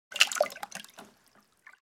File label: Liquid paint sloshing paint can